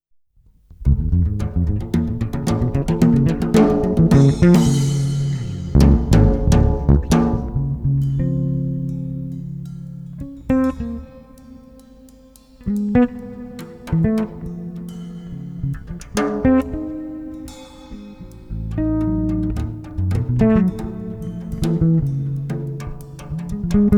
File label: Duet; HD Mastering Audiophile Test